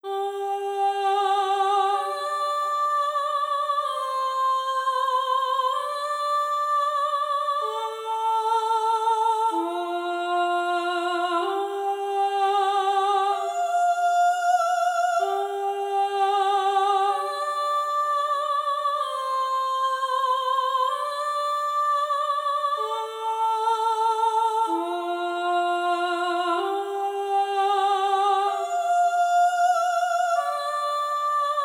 voxcomp cinematic; by burak sound bank; tension gerilim vol 52

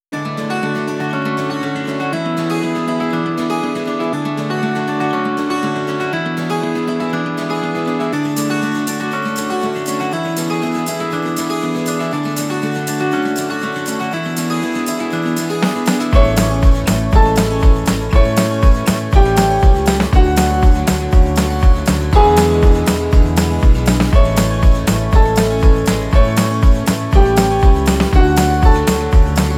cheerful entertainment guitar; by burak sound bank; cheerful vol 16

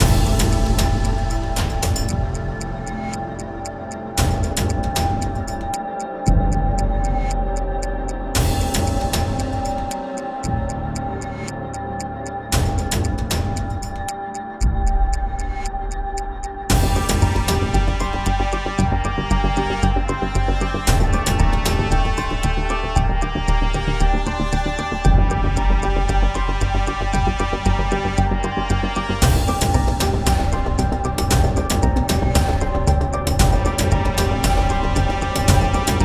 cinematic epic; by burak sound bank; tension gerilim vol 50

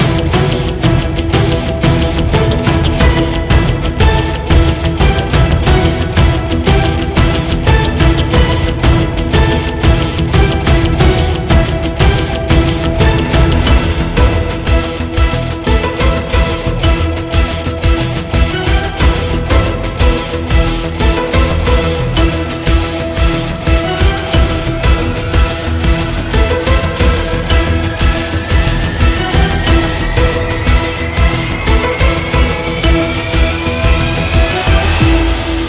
venue tension guitarcomposition; By Burak Sound Bank; tension gerilim vol 2

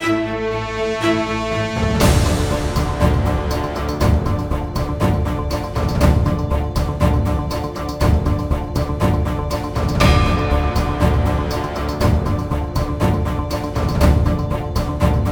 tension; by burak sound bank; tension gerilim vol 44

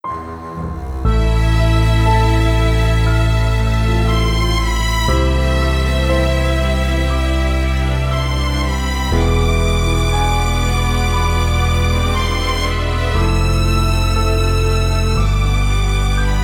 dramatic cellos klasik ayrilik epic; by burak sound bank; dramatic dram vol 19

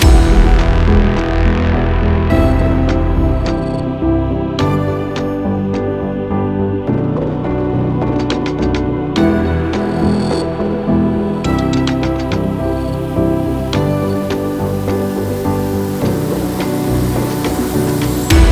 tension, horror excitement thriller song

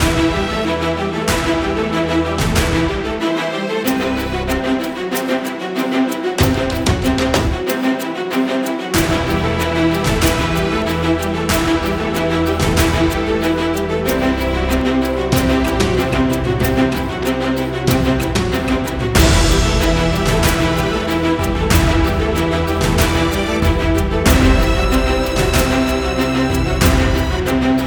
tension; by burak sound bank; tension gerilim vol 48

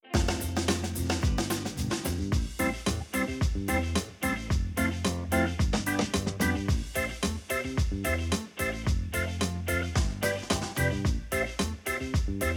sweetness guitar; by burak sound bank; cheerful vol 2

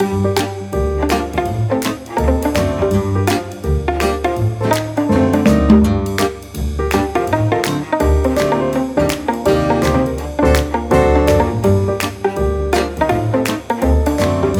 cheerful strings; by burak sound bank; cheerful vol 12

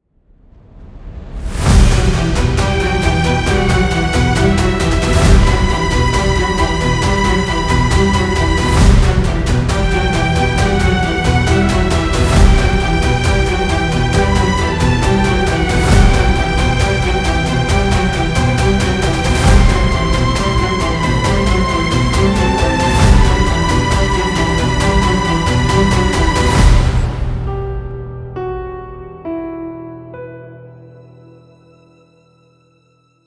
tension violin; By Burak Sound Bank

